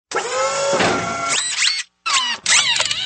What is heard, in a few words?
robotic background music sms tone ringtone